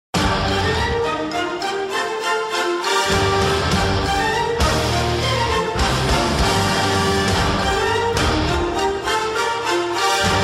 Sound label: Epic Music Mix